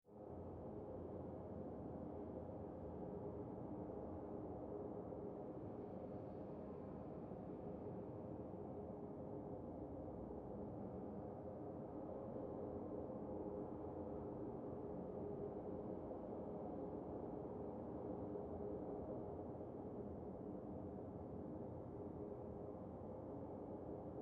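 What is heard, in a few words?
Wind Tube Resonant Reverb; Weather; BigRoomSound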